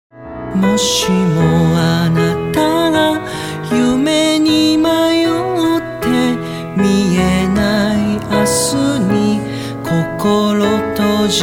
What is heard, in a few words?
solo